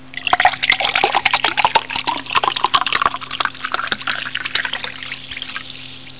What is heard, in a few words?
GLAS; 999wavs